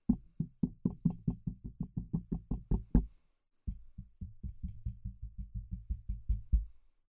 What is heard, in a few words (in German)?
Beyer MKV8 DIY Shockmount Beispiel; Shockmounts